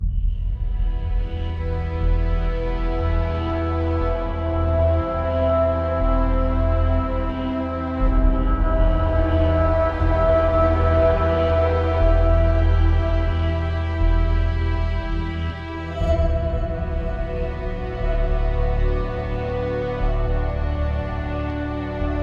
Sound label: Depression Ambient